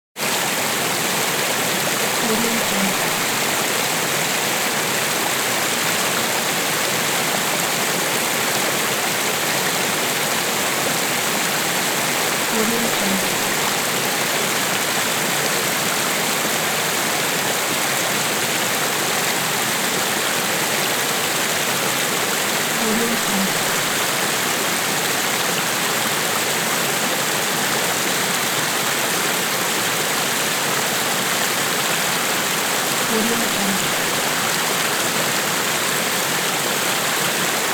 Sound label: water stream running